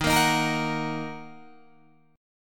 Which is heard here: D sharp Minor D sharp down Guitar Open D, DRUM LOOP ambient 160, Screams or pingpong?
D sharp Minor D sharp down Guitar Open D